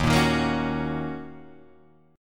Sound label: D sharp Minor 7th, Guitar, DADGAD